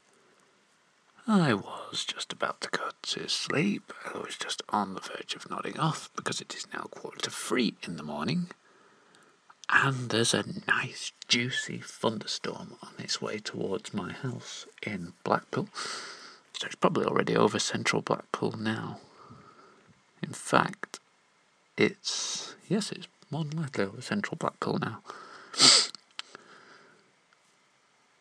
thunderstorm in its way to blackpool

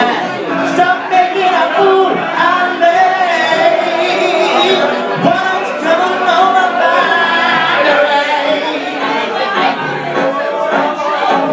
players bar

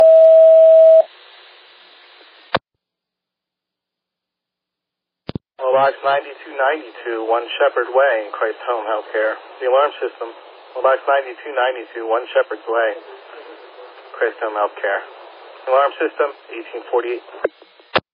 alert page; warminster

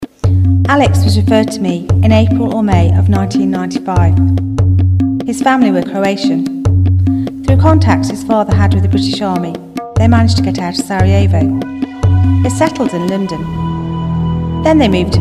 korg karma workstation trak, electronic pop

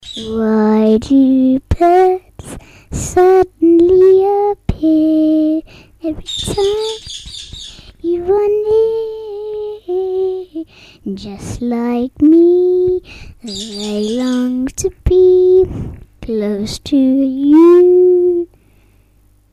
sings

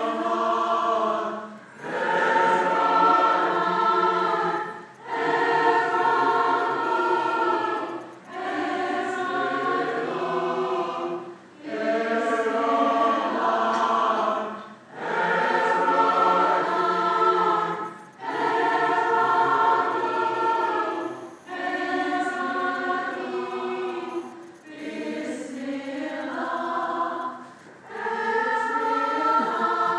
choir

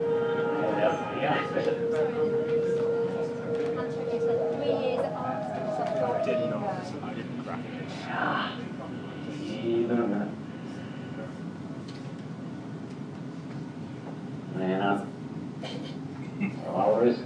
backwards song; 4kingsquiz

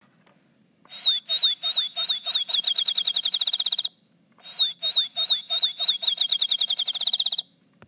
field sparrow

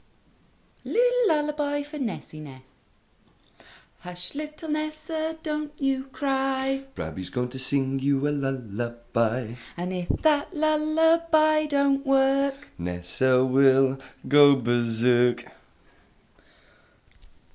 lullaby

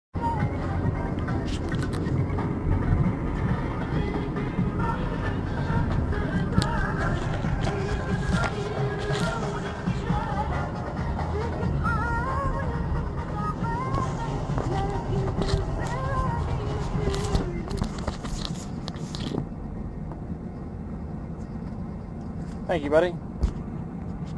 taxi

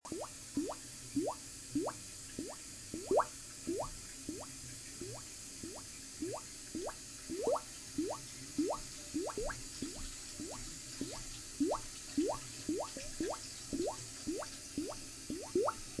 bubbles

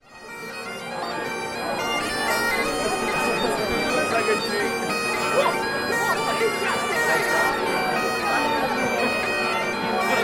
bagpipes and the

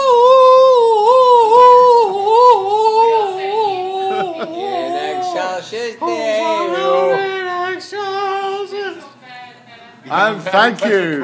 singing hark the herald angels sing

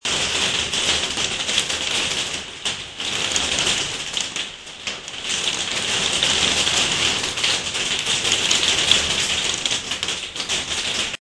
rain on conservatory

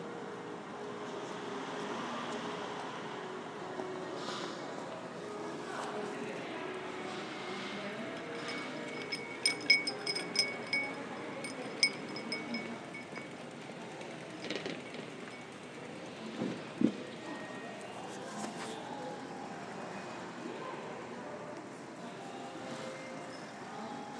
sitting outside panino on a saturday afternoon

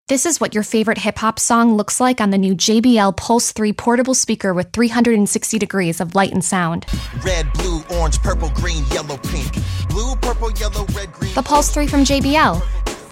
Hip Hop Song; jbl

